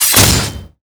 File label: Fierce Attack; MUDevs S20.1-3